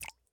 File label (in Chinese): 滴水石锥水滴落声